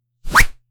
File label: a very loud card flipping